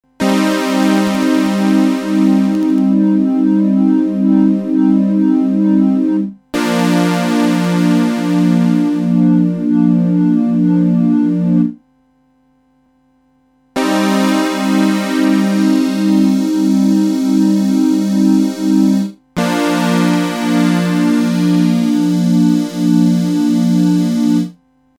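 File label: low res saw to sine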